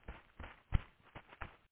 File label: deep footsteps